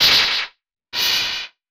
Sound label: TECHNO FX 140